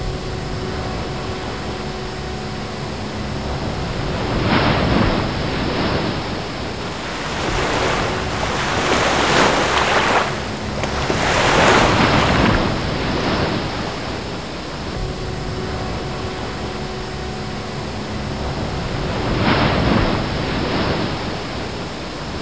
ocean; quake2; beach

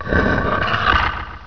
death; quake2; raptor

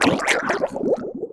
drown; quake2; zumlin

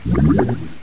gurp; quake2; ranger

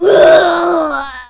death; quake2; astro

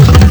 jump; quake2; bug2

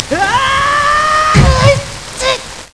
fall; quake2; link

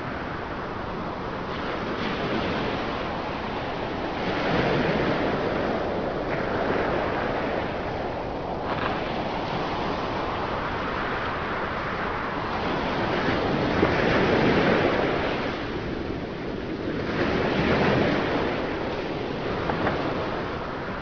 beach; quake2

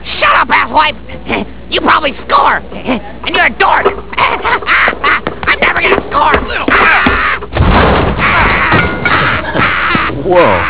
death; quake2; bandb

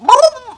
gurp; quake2; muleskin

